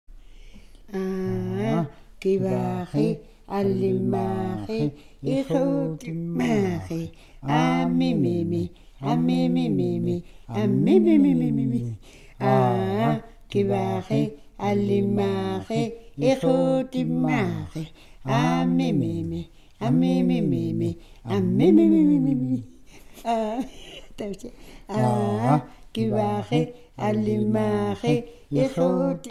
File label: Counting Play Song, INUIT; Counting Play Song (III)